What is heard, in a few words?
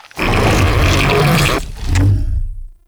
Ancient Tank Attack, Enemies, Infested, Barks; Warframe